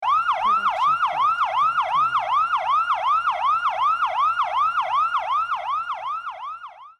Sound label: ambulance